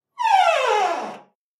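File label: door creak small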